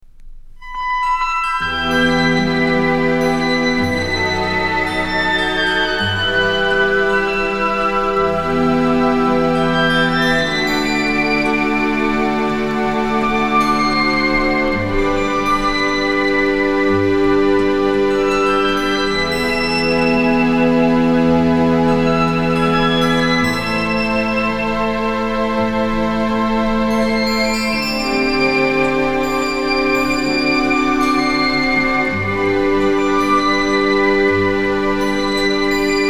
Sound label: synthesizer tune strings and guitar to choir tune